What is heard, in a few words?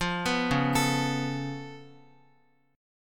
Db Augmented 7th Db down Guitar; arpeggiated; JGuitar